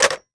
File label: Telephone Receiver Down; Communications